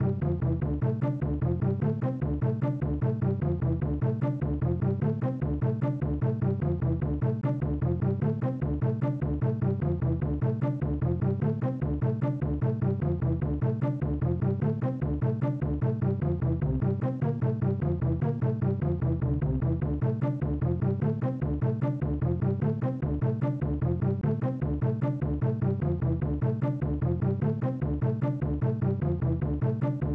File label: Jungle Rhythm; Pitfall The Mayan Adventure; SNES